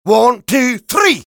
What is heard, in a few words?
taunt exert; demo; rps